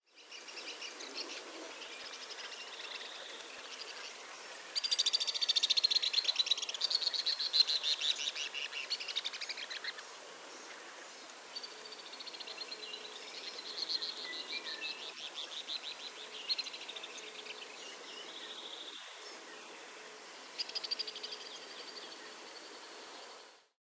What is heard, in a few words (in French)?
martinet a gorge blanche, chant